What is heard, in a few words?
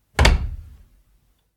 Doors House; BBC